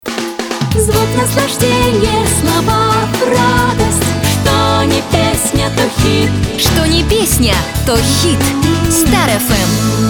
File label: radio jingle; ru-starfm